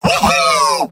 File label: m battlecry; tf2; garrysmod; mvm